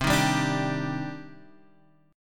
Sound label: Eb Major 7th C; Ebmaj7/C chord; JGuitar